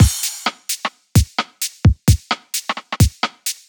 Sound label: Group Processing